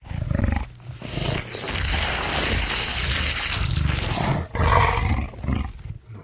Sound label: eat, creature; stalker